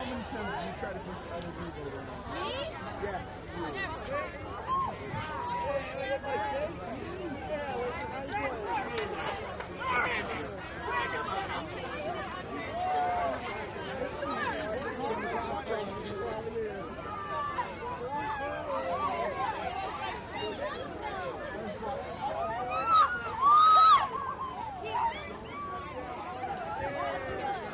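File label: Walla Active Young Crowd, college ext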